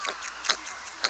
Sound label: Lemur grunt